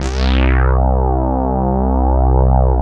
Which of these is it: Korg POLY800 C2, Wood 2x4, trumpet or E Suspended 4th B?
Korg POLY800 C2